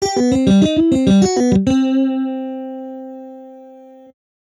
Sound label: Lick in C